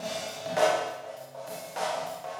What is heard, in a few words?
Processed Beat, Drums & Percussion